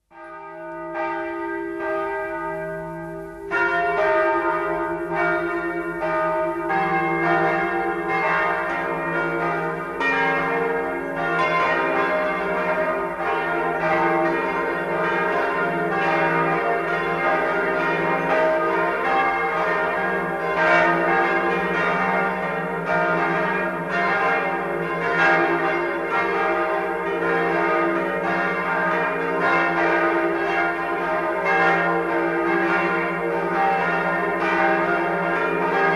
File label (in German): Glocken von Hartkirchen am Inn